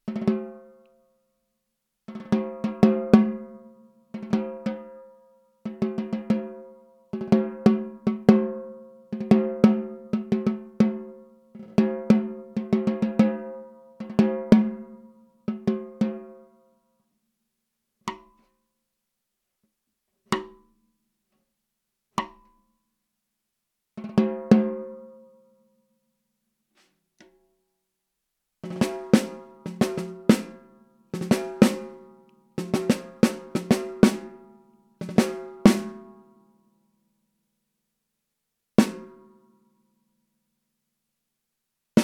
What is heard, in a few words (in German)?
Tama LMP1455 mittel